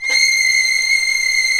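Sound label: violins, agitato, fff; String Master Series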